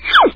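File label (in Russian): мультяшный свист падающего предмета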